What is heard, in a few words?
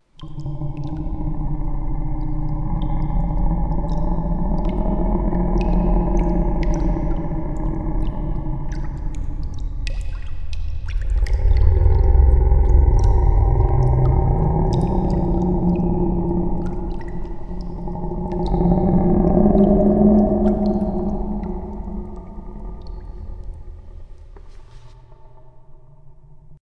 pyramid growl; cydonia